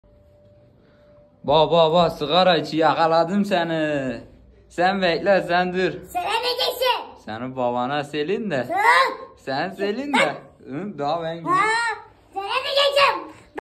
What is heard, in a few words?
azeri bass music